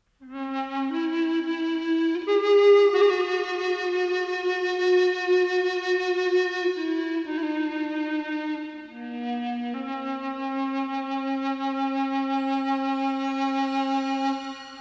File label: Alto Recorder; Indonesia